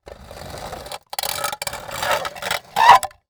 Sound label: Metal Scrape On Wood Squeak; foley